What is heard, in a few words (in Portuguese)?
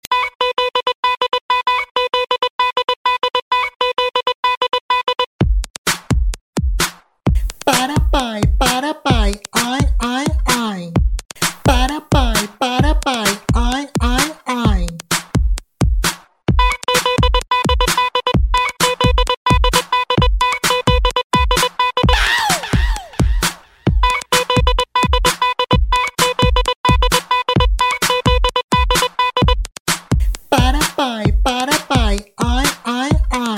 funk brasil